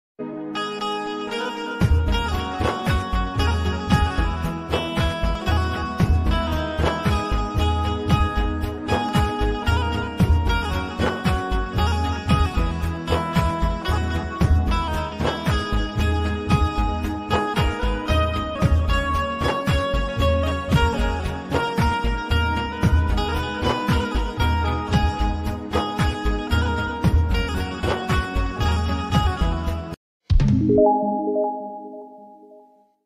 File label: best old instrumental ringtone old is gold